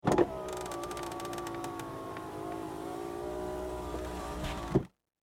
Vehicle Auto Window Up Automatic Power Automobile Car; Cars; Vehicles